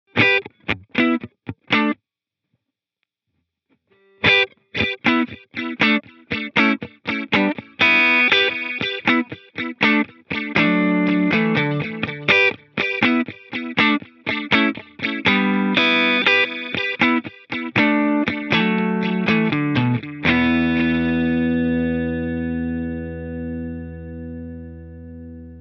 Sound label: Tape Echoplex